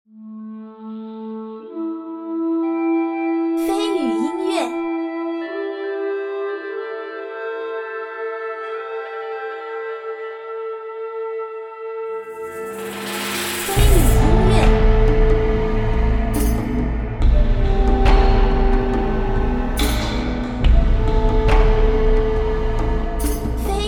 巍峨-壮观-大山

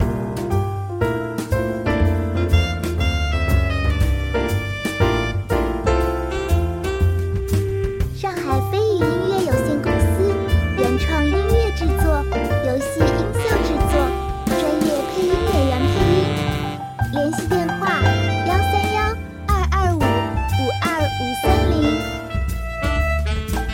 爵士休闲; 豆子世界

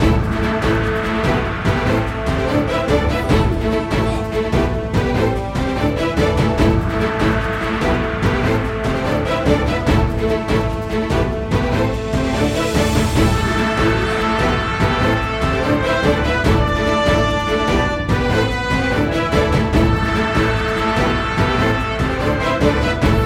普通副本 Fight BG

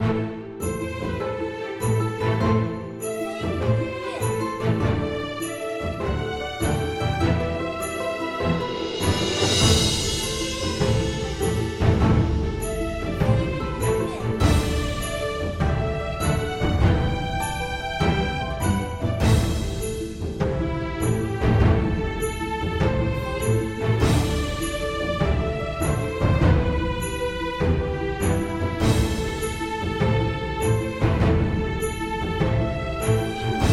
管弦-大气-舒缓

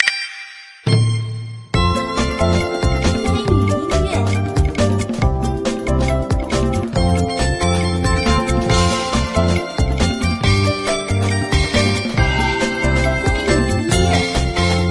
战斗 京剧 激昂

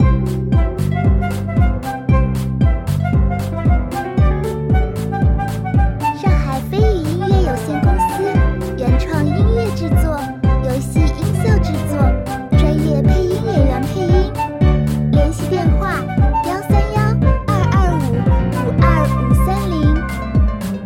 Q版 欢快紧张; 泡泡精灵

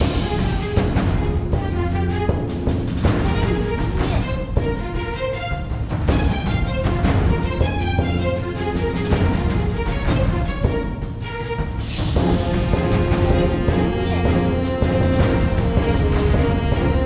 战斗 PVE

